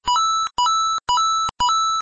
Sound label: coin sequence; mario